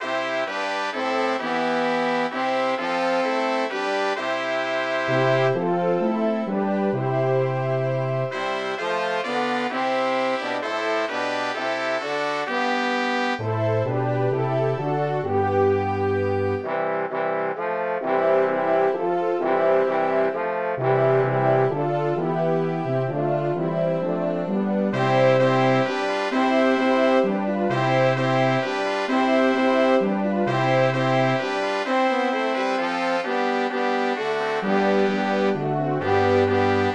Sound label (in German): gelobt sei gott im hoechsten thron vorspiel, Posaunen